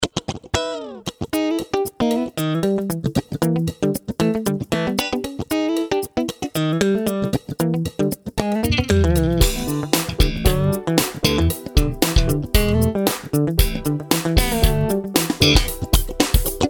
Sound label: Funky Clean